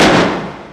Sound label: dual pistols fire; weapons; duals; Dystopia